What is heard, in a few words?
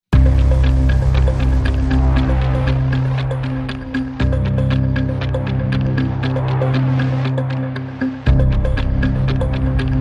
No Strings